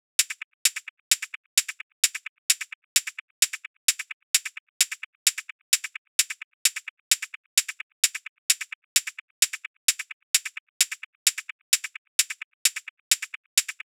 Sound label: Techno Hihats